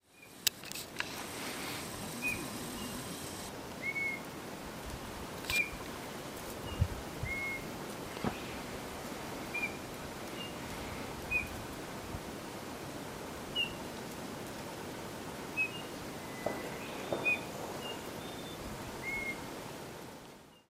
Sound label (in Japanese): uso, bird song; さえずり②; 群馬県富岡市